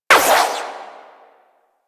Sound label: Shot, Double Barrel Shotgun; Warframe; Tenno; Tigris Prime